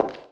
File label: ashioto room small